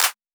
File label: Clap; Drum One Shots